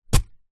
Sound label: metal shovel spade single dig grass soil